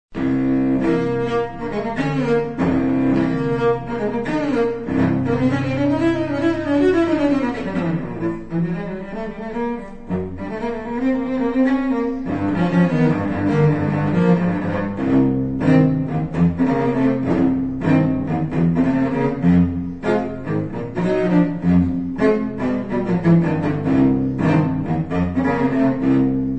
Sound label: stomp